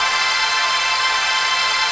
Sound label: PAD, Dance Mega Synth; Best Service; 90 s Sample CDs